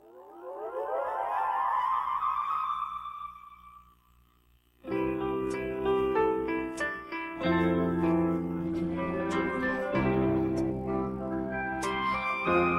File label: magic bird music